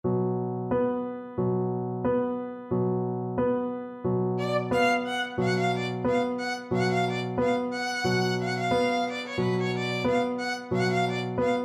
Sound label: romanian folkdance, violin; bartok